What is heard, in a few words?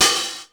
909 Hat Open, Ensoniq ASR-X Pro